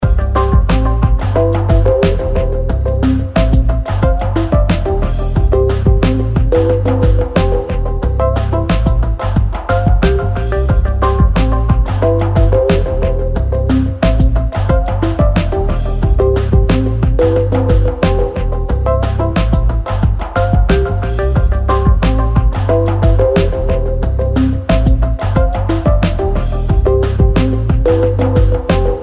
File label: chillout sequence ringtone